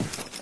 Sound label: player tile; Source Engine; dod